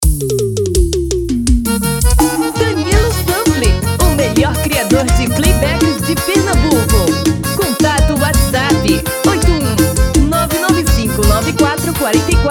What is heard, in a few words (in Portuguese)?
Xote Vanerao